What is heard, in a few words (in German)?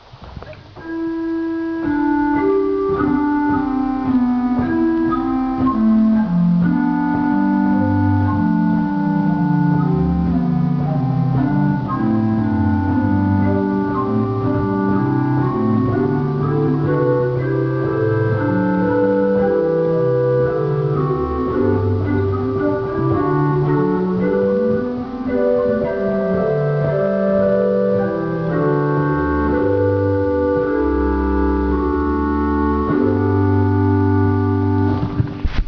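Rohrflöte 8, organ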